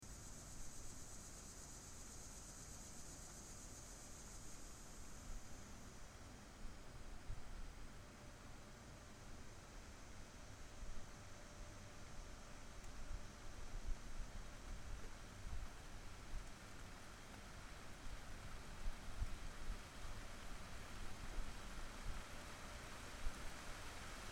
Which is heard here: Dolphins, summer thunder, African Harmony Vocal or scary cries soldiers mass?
summer thunder